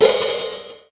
Hi Hat Open; Millo V3

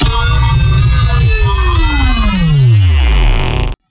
Riser Rev Faling

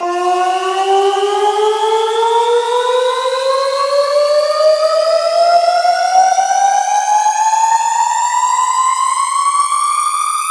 Riser Saw Rise

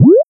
Gliding Beep

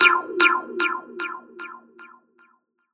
Old Dub

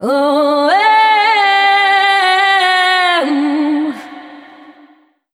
Vocal Uhhha D

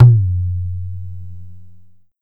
Perc Tabla Ghe

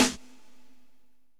rock snare

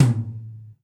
tom; foxdot default

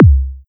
Kick

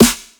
Snare

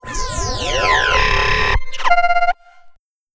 Sound FX Space Ship

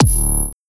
Kick Dist Bloom

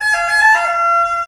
Sax; pitchglitch samples

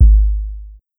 Kick Soft

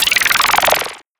Sound FX Hi Tech

